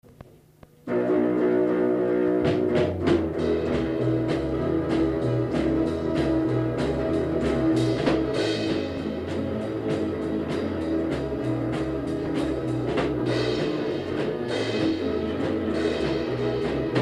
big-beat night lo-fi

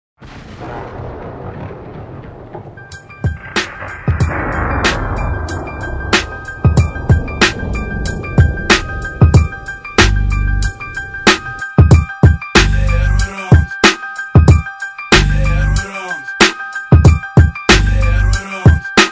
hip-hop

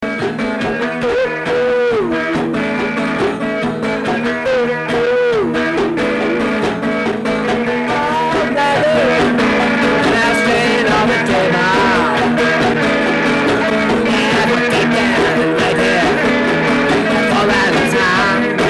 alarm clock

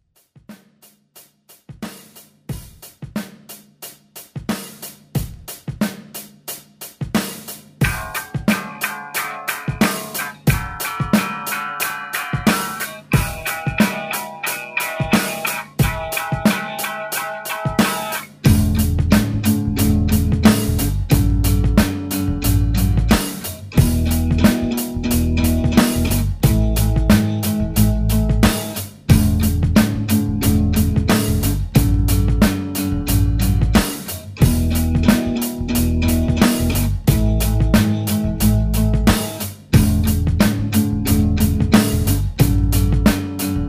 guitar

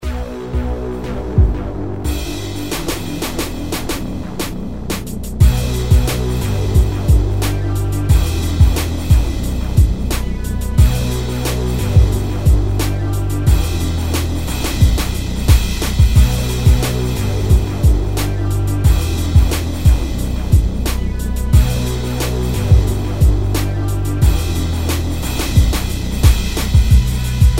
sintetic instrumental

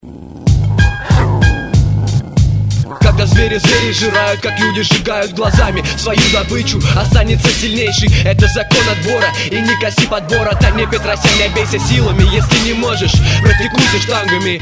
street rap battle

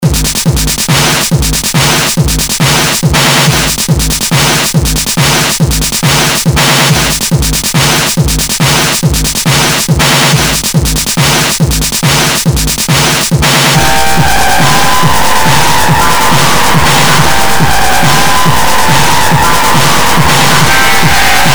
distorted noise

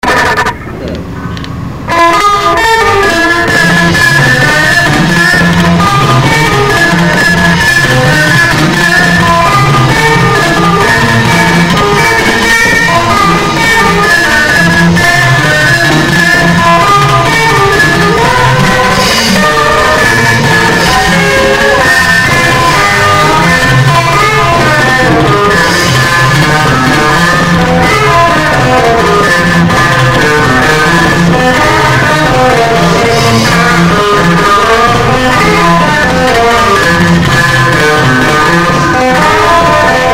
instrumental

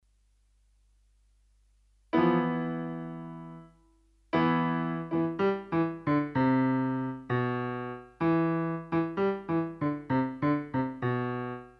riff

